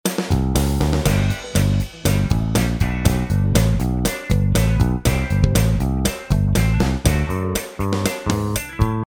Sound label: 60s Soul